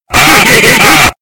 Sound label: Heheheha